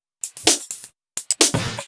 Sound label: Electro Loop 128BPM; Vengeance Samples